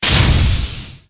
PISTON; Heretic II

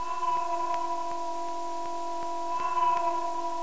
ALARM; Image Bonanza

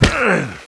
Flsh Hit

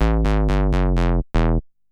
SNTH BASS PROGR 125 A; eJay

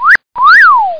Electric Wolfwhistle; DR BRAIN; BUGS

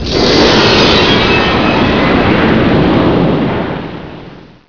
Powered Ex; Crystal Caliburn™ DEMO

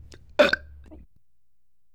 belch

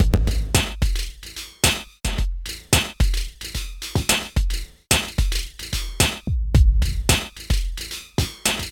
Sound Kit; battery; NI Carbon; Alkaloid Kit Demo

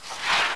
missile; Flying Nightmares Demo; Flight Sims

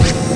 spike; quake; halo; player

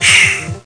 Sigh

